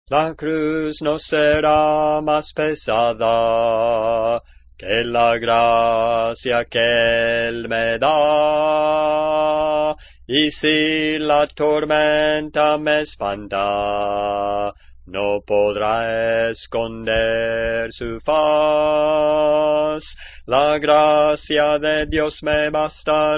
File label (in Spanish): Bajo, Cantos Espirituales